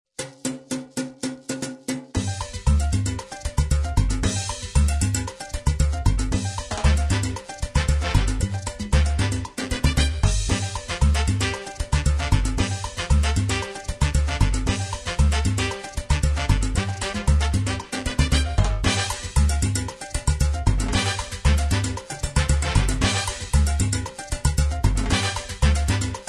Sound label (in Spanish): promos salsa